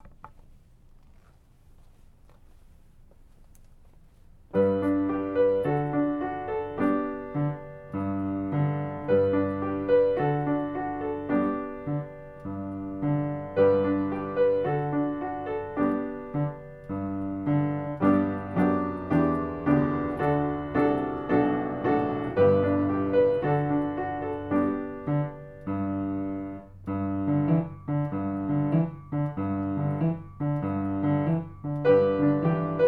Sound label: recorder